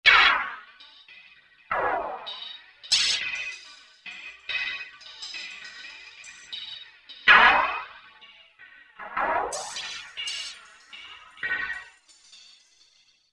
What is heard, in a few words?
Rain Leak; Korg MSC-14S Sound Effects